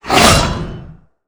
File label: Normal Attack; Ferea